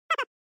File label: Horror Laugh Dry